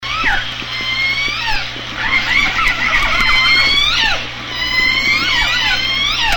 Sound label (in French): orque orka; WWF Rangerclub